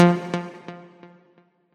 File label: combo; quatuor